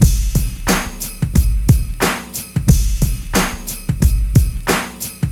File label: 90 bpm g gangsta beat, Gangsta rap drum loop if you need that old school gangsta vibe - bass drum tuned to G; drum loops